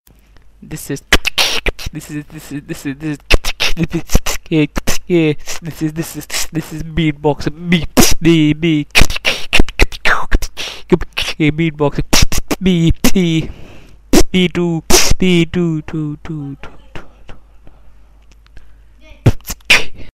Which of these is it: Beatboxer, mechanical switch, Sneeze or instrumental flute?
Beatboxer